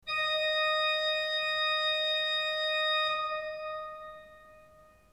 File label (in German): ton, orgel